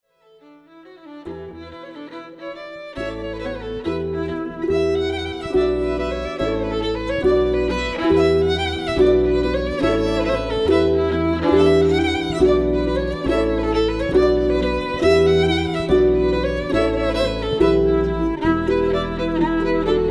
Irish Band; Orlando; The Road to Lisdoonverna

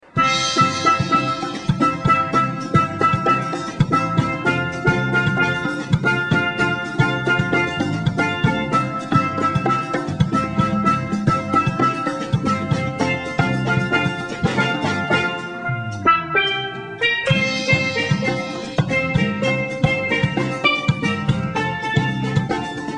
Steel Drum Band

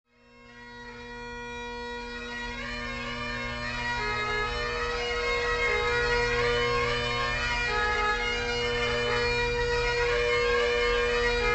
Bagpiper; Los Angeles Bagpiper

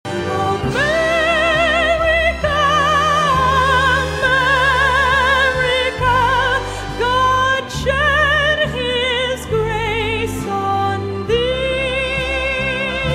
Opera Singer

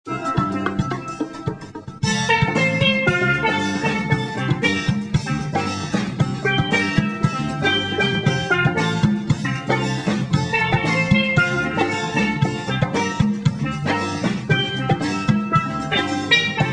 Steel Drum Band

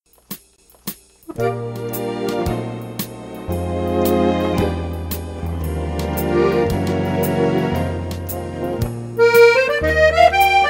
Italian Band; Denver

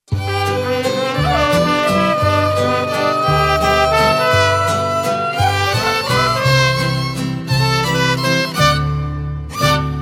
Mariachi; Spain

